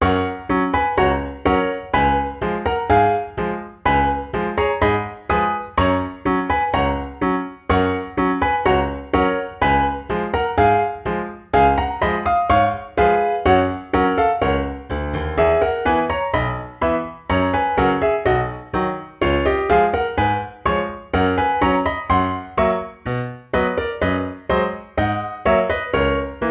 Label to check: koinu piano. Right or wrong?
Right